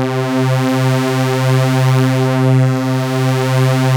OB C4 -R, Rare Synthesizer; Hollywood Edge - Giorgio Moroder Rare Synthesizer Collection; OB 4 VOX.

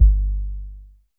Tr-909 Kick Mid, Dance

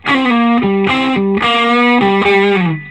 SOLO G, Vintage Blues Guitar

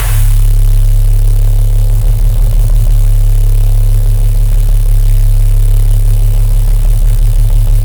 Dist LFO Tones; neuro; BIG5AUDIO

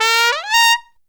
T A# SSU, TRUMPET FX; Zero-G; Phantom Horns

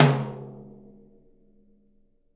Living Drums; Sampleheads; Peter Erskine

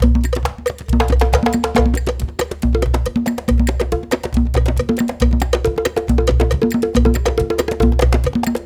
138, Lead Drm, Gyana HiLife; Spectrasonics; Supreme Beats

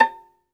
SOLO VI, Synclavier Strings; ILIO

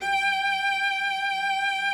G5, cello vib; Xsample VOL-7

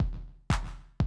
Classic Drum Loops

